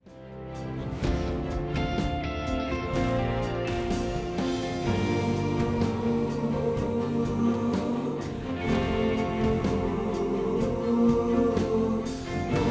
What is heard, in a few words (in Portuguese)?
contralto; coral; kit contralto; coral novo canto